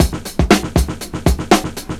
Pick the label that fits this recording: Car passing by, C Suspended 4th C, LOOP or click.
LOOP